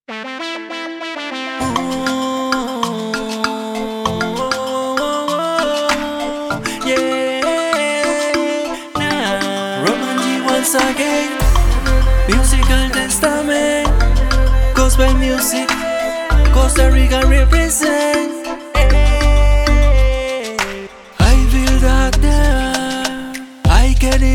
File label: spanish